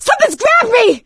grabbed by smoker; voice; Left 4 Dead 2; producer

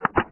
Mech Crunch; Sid Meier's Civilization 4 Complete; Beyond the Sword; Units

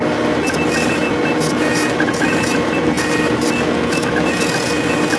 command center, ambient; Team Fortress 2

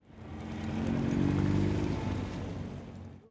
dust car driveby; Counter-Strike Global Offensive

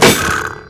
sawblade stick, metal; physics; Garry's Mod; hl2

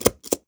start focus; Hearts of Iron IV Death or Dishonor

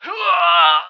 normal dying; Emperor - Battle for Dune

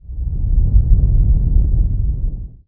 worm rumble; Emperor - Battle for Dune

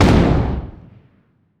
ship hit small effect; Hearts of Iron IV Death or Dishonor

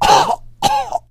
choke, survivor voice; Left 4 Dead 2

